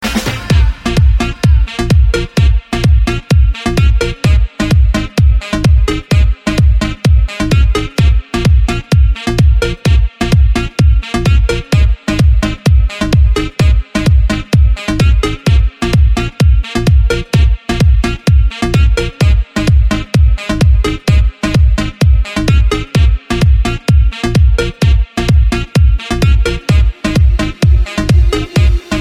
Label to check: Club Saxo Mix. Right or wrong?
Right